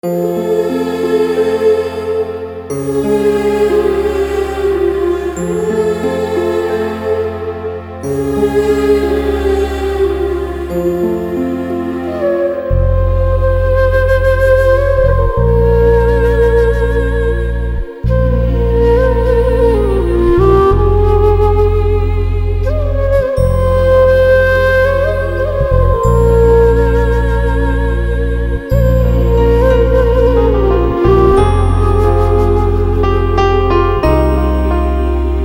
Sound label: Instrumental